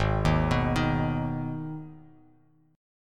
G sharp Minor G sharp down Bass Standard, chord; G#m arpeggiated; JGuitar

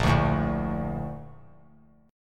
Bb Major 7th, chord; BbM7 strummed; JGuitar

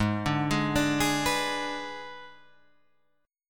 Ab Diminished, down, Guitar, Open G

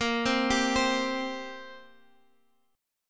G Minor Double Flat 5th, Bb

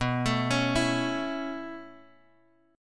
B 9th Suspended 4th B down Bass Standard; B9sus4; arpeggiated; JGuitar

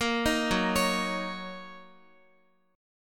F sharp 6th Guitar, chord; arpeggiated; F#6; x,x,8,8,6,8; 4E,4A,5D,5G,5C,6F

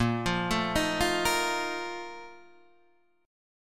Bb Major 7th Suspended 4th, Guitar, Standard; arpeggiated; JGuitar

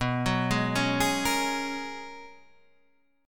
G sharp Minor 11th B, guitar chord

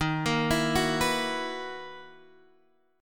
B Major 11th D sharp, guitar

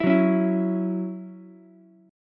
Db Minor Double Flat 5th Db Ukulele; strummed; Dbmbb5; JGuitar

